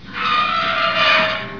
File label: gate; openffa